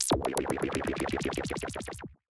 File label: C1, SynthLine; 90 s Sample CDs; NiceBeats; SynthLine VOL-14